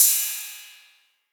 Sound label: crash sound sample d sharp key, tr 808 crash cymbal